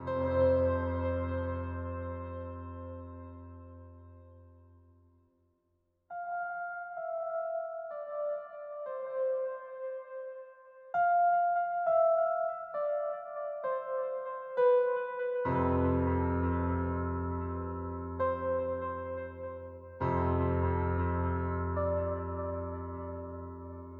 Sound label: piano, Loops Sad